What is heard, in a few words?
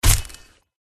iguaron attack contact bottom